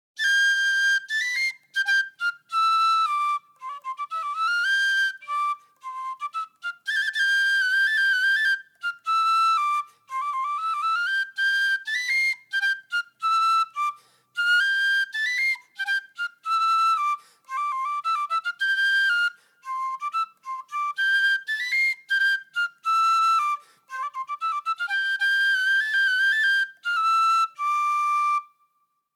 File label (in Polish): fujarka beskidy melodia pasterska